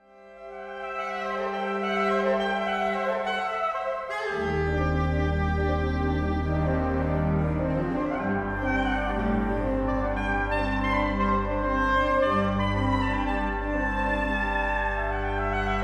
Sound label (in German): Sax Orgel; saxophon; Friedenskirche KS; Friedenskirche KS 08.09.2023